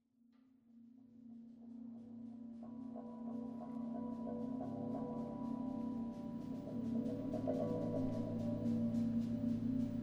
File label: Noise Drones